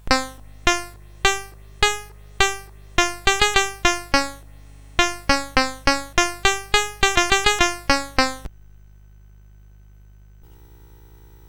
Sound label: Japanese Koto, CSG8580R5